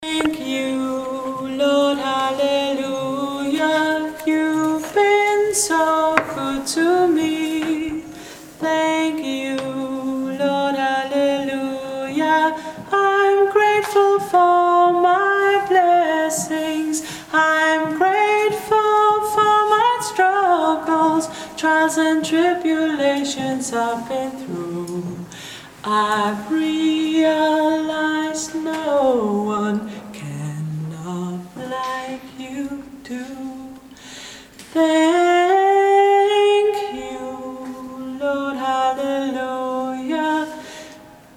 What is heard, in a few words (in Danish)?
Sopran